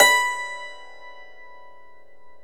DULCIMER B4, Stereo Dulcimer

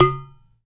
UDU, African

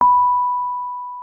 Rhodes MK1 B5; Xsample VOL-1

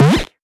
Misc Short FX

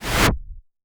IMP WHOOSH

